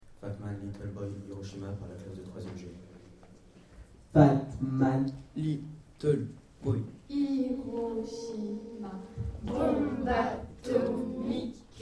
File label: phasing